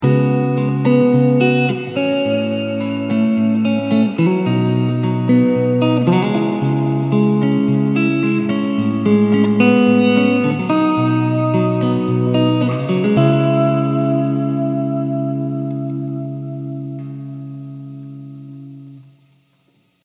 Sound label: hall, guitar effects; rv5